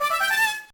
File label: Item Fanfare; onimusha tactics; gba